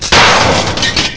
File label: SM CRASH